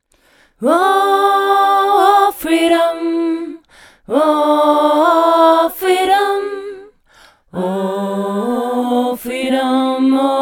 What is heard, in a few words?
bas